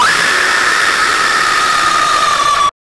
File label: jump scare demon